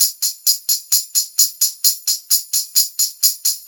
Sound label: Brass Tamb, Perc Loops; Sonic Foundry; Drum Tools